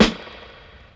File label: snare one shot; maybach